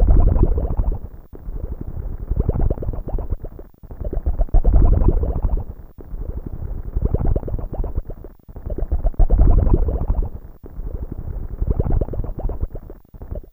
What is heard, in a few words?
Mud, Fairlight CMI IIe